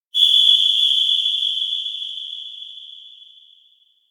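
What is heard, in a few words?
electronic grasswhistle play; hanayama; anemoi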